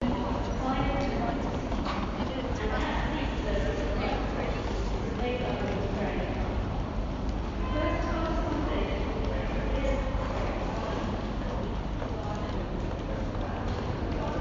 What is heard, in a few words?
Train Platform Noise